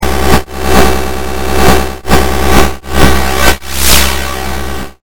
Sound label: Shuffle